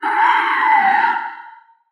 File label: raptor roar medium; soundscrate